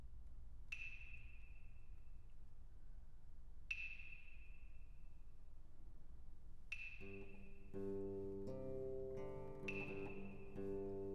Canarios, improvisation for soprano viol, double harp, guitar, organ & claves; Ostinato